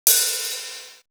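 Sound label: Hi-hat; 90 sSampleCDs; Coldcut's Kleptomania VOL1; Drum One Shots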